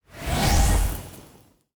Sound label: trap dark main